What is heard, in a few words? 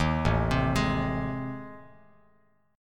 A Minor Major 7th Flat 5th, Bass Standard; arpeggiated; AmM7b5; 11,0,10,13; JGuitar